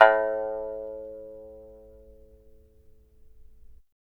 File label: SAN XUAN A3 MF; Spectrasonics; Heart of Asia